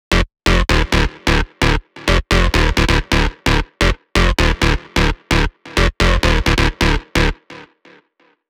TECHNO SYNTH LOOP WET D 130BPM; TECHNO PARADISE

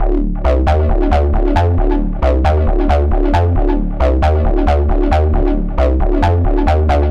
TECHNO ACID LOOP F 130BPM; TECHNO PARADISE

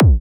Kick E

